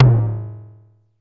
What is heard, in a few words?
MID TOM, DRUMS; Music Store Sound Service; Einstein